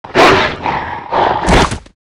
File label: Puppet Wolf die; FlyForInsanity